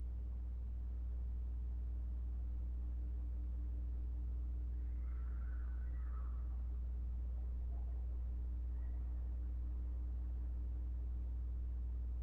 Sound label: classroom scream; Electronic Voice Phenomenon; More Screaming; statehouse